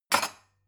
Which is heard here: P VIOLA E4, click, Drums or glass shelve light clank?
glass shelve light clank